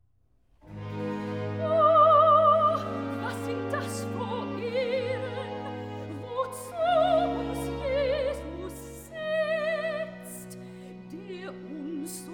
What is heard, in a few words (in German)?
Recitativo (Sopran); vocal; soprano; BWV059; O, was sind das fur Ehren; BACH